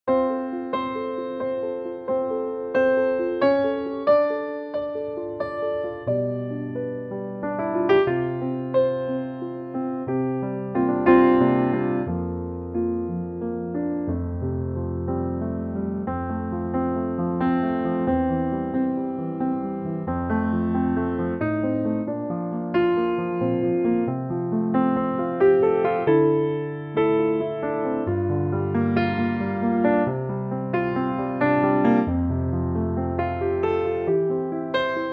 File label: piano F